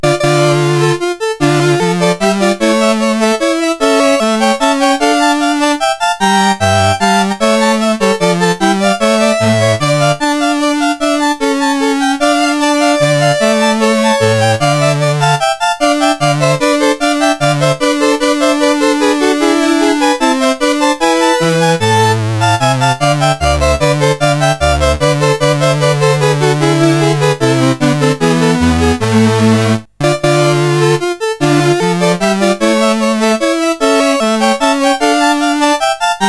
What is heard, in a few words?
Corrente, CSG8580R5